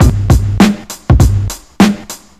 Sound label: sadistic beat, audioloops